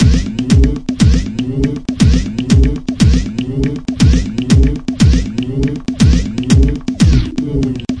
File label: 120 chompy groove, drum loops and breaks